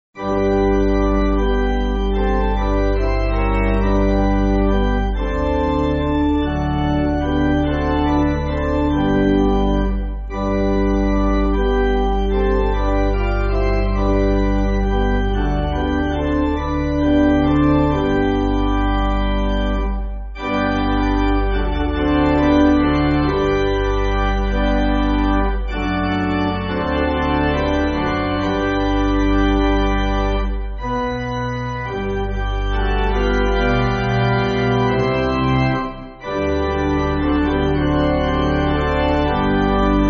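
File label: Pipe Organ, Backing Files for Churches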